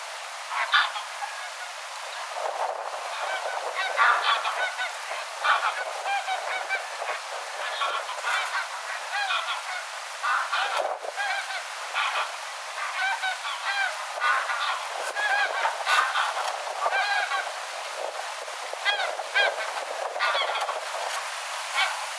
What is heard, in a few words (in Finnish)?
nuoria kotihanhia, nuorten kotihanhien parvi lentää rannan ylitse; Tässäkin oli pelkkiä nuoria.; Pitkälle rannalle